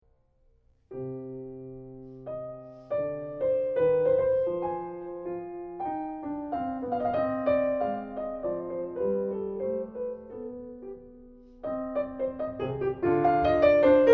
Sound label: Menuet, solo piano; J.S. Bach French Suite in C minor, BWV 813